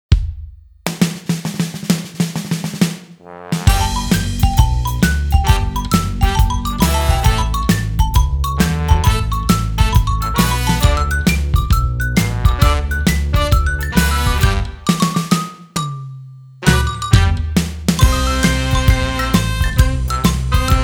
comical xylophone band; pianoteq7

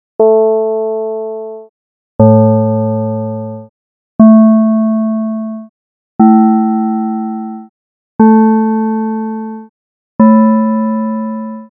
chowning

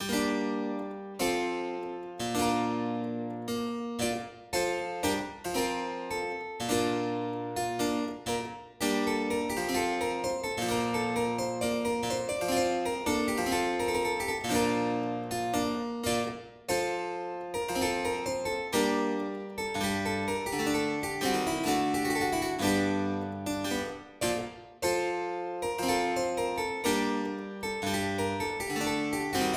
Almande; Anon Dutch 16th; Almande Prynce; pianoteq8; karsten

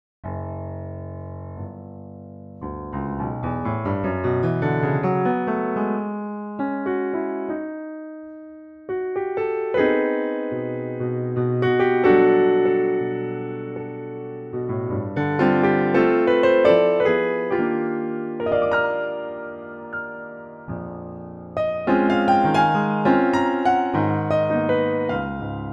Improvisation; Miles Black; Improvisation No. 11; pianoteq8

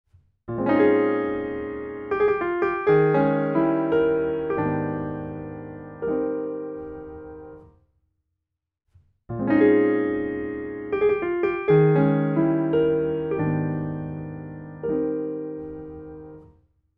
overtones

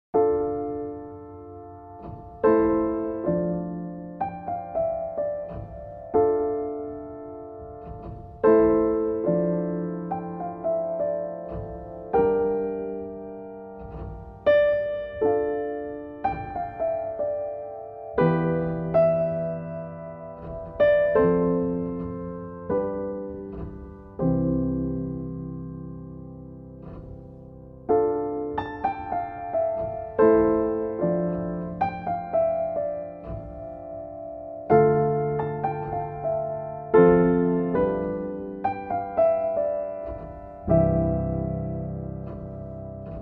Keyboard; Steve Nieve; Keyboard Jungle

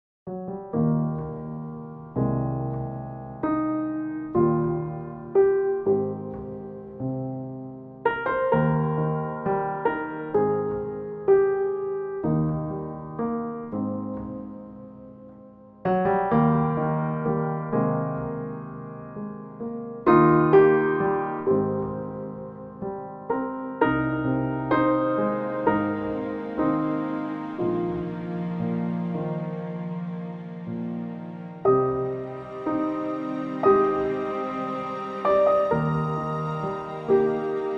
U4 Americana, pianoteq

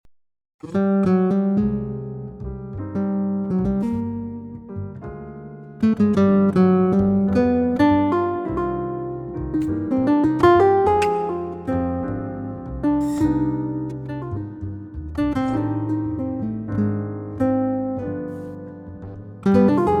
Tango, guitar; pianoteq8

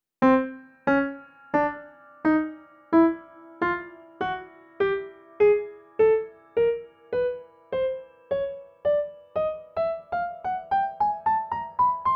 sympathetic resonance

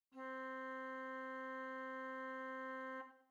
Physics Gambe, organ; organteq2

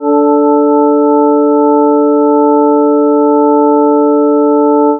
accord quint

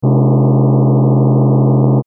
timbale

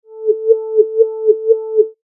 wawa flute

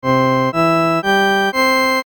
arp temp

